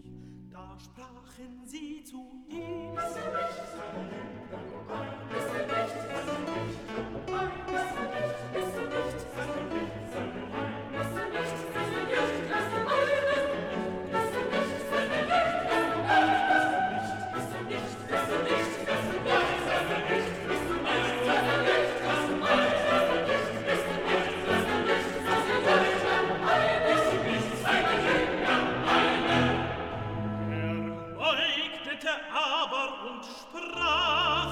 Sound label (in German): TENOR, Stimmen mit Klavier; Johannes Passion